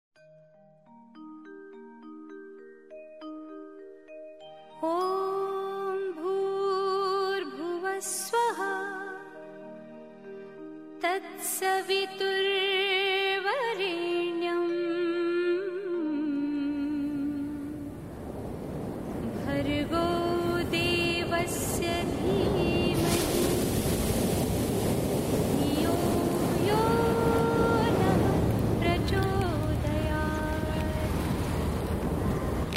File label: soundscape bonfire birthday bikes amphitheater